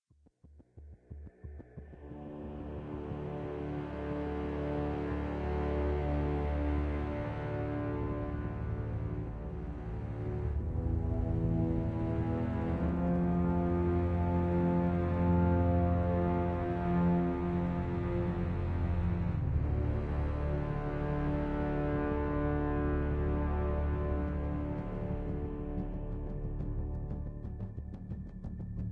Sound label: player turn spooky; devils demons android game music